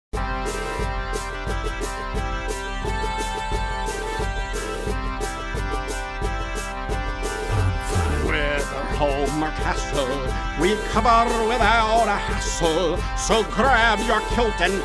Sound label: Marching Jingle; Highland Insurance Jingle